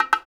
PRC, Bongos; Spectrasonics; Supreme Beats; World Dance

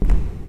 Foley lo

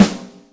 Ambient, Yamaha DTXpress Acoustic Snare

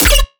bass hit hiccup D#; Virtual Riot

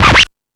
Discoball, Drum Machines E-MU Pro-Cussion

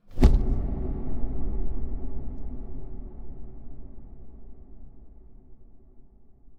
boom woah

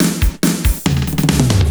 Fills, Loops 140 BPM

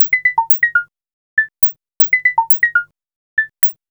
POLYMOD; AMG - Vince Clarke Lucky Bastard

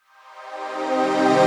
Reverse Synths Cmin; Effects; Vengeance; Essential Clubsounds Vol.4

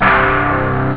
alien guitar; Amiga Soundtracker sample

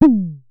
Synare Filter; Star Instruments Synare 3

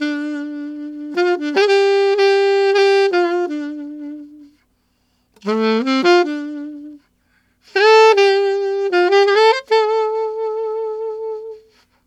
Alto Rock Ballad in D min, Sax; Sonic Foundry (Sony Creative Software)

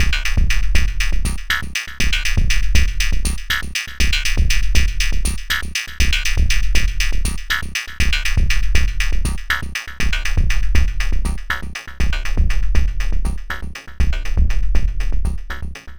Synth Bass; Sonic Foundry (Sony Creative Software); Electrocution

